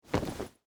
Winter Coat Fold, Clothes, Over, Arm; Foley; BlastWave

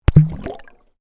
Water Microphone Dunk Shallow Submerged

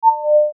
Beep Tone

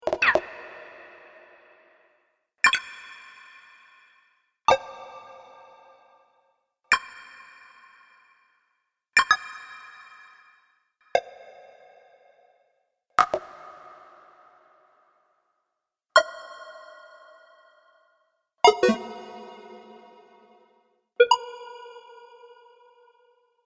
Scifi Activate

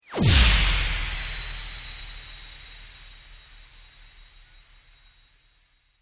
Sweep Mechanical Medium

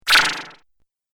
Laser Zap, Laser Gun

